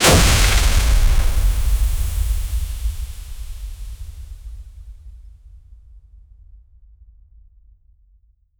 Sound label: a magic frost beam impact, elements